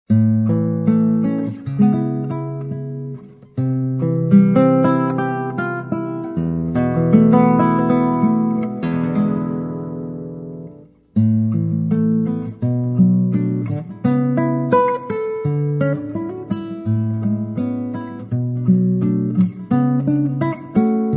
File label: solo guitar